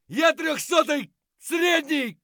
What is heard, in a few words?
hurt medium; Escape from Tarkov